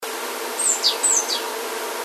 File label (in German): meise rufe; nistkasten